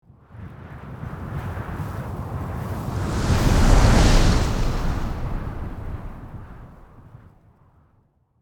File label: Desert strong wind blow sand and dust; nature